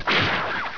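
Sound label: sword hit; Rayearth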